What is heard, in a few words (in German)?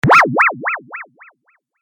Zwirbel Sms